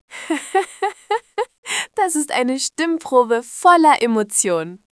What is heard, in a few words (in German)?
Lachen; Stimmen